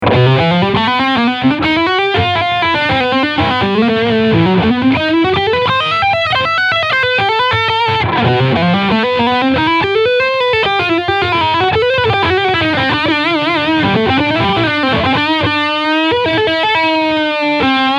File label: Leadsound 2